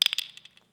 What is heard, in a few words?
drop plastic